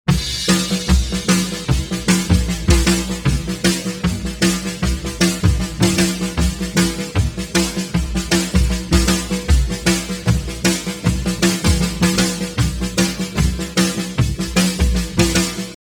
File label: drums pattern slow